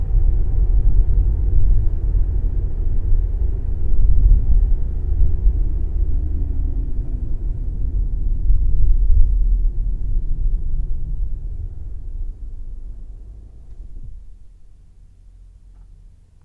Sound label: stopping to drive; car